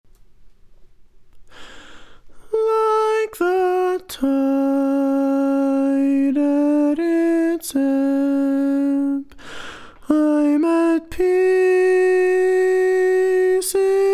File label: Lead, barbershop tags